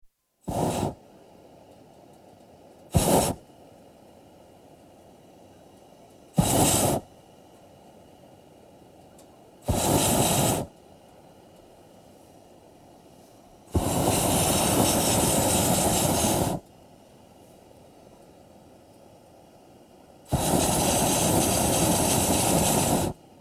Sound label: Hot Air Ballooning, balloon descends, burners get fainter & shorter, lands with a bump; Miscellaneous Sport; BBC